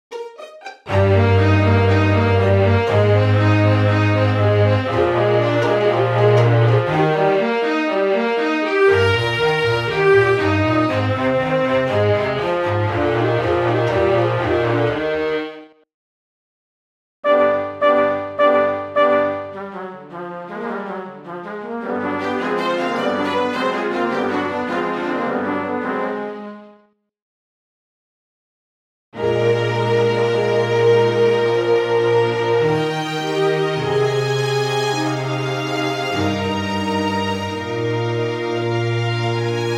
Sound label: Classical Song